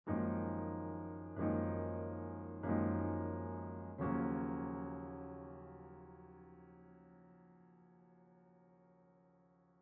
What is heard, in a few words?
Piano